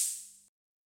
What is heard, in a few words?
open hat; drum